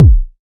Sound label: Kick; Samplepack Produtique